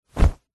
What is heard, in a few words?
clothing sports shorts single hard flap